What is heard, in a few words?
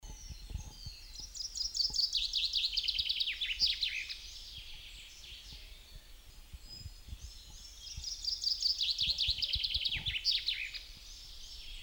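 zyablik voice, chaffinch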